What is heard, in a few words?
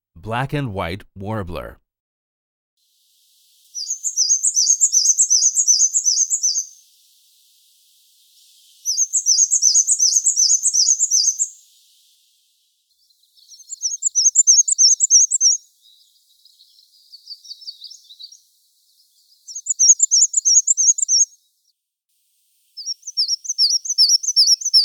Black And White Warbler, bird calls; Non-Game Birds